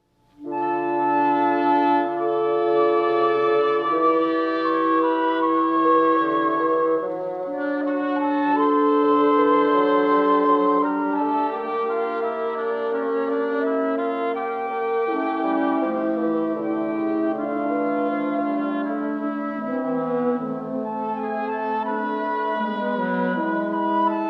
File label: Prelude and Fugue in D Minor, Wind Quintet; BMV 539